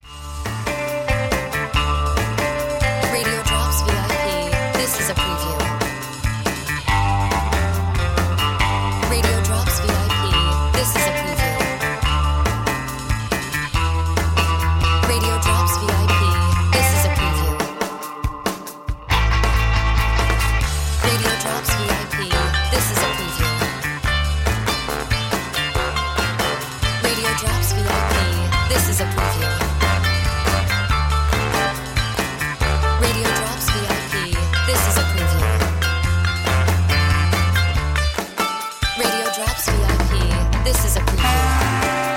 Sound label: Music Bed